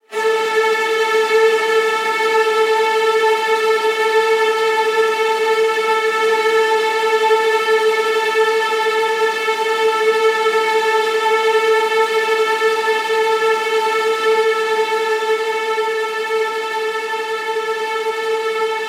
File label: Tremolo Strings - Amin 120BPM; Construction Kits; STEMS; Ghosthack; SkyRealm; Kit 05 - Heart Of Fire